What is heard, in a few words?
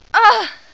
Ugh, voices; Riviera